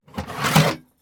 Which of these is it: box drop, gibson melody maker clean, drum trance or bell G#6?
box drop